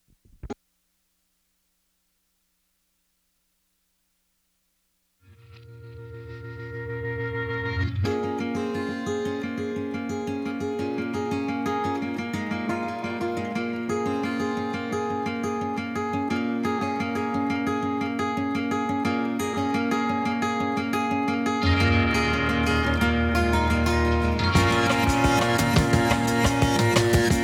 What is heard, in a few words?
rock n bass